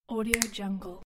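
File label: snapping with multiple fingers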